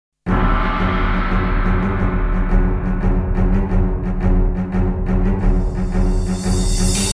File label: Boss Battle Theme - Intro; Tidal Wave